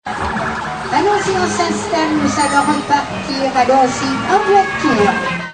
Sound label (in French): Fete foraine; bruitage